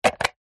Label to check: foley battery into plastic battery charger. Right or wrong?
Right